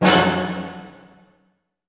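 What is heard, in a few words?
C minor fortissimo, orchestra hits; Sound On Sound - Peter Siedleczek's Orchestra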